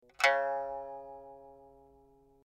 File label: pipa; chuYinKouXian